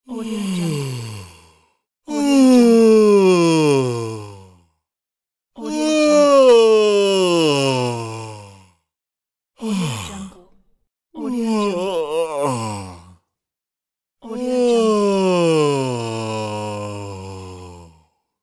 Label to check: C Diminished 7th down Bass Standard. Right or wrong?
Wrong